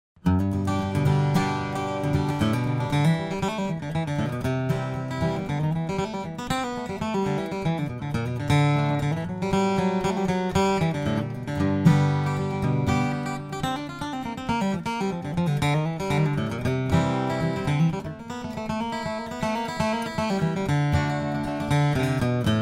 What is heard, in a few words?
santacruz vintage artist; steel-string guitars